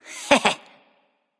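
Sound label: heh heh, female; smite; voicepack; hera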